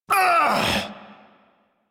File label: Struggle Short; New War; Ballas